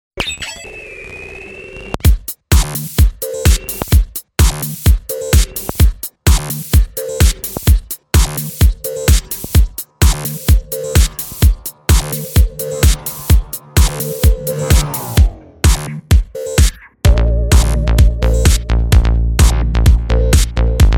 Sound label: funny noise